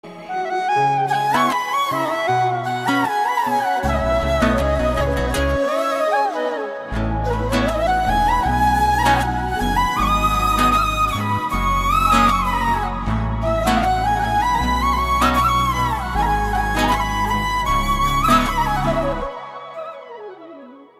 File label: FLUTE RINGTONE